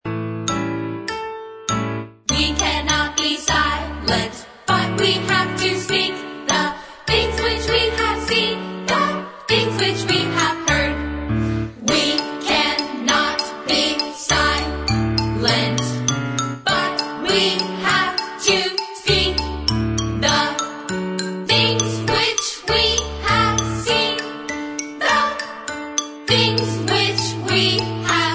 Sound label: The Speaking Melody